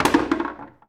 Foosball Goal Score

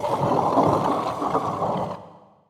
Crawler Hit

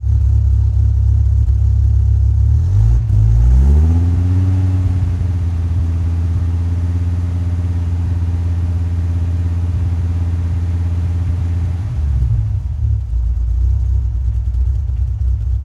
Viper GTS Fast Idle; Automobiles

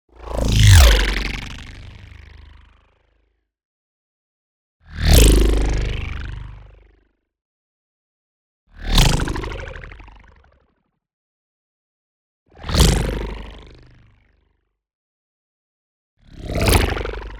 WHOOSH LARGE FAST Abstract Lifeform; boom library; Neon

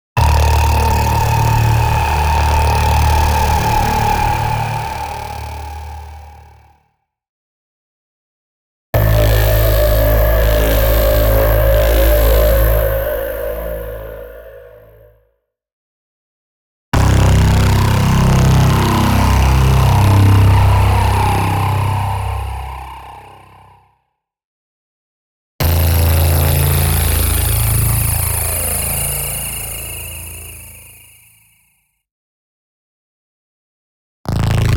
NEON BLAST; Apex; boom library

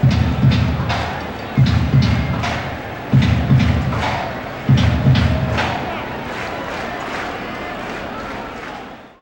Baseball Organ Crowd Claps; SFX Kit; Crowds

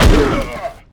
Football Hit Tackle

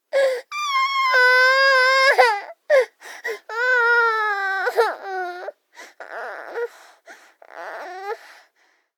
BABIES WEEP Girl 24 months Tired; Baby Boom

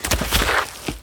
Bodyfall Concrete; SFX Kit; Bodyfalls

